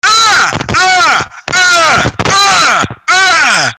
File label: augh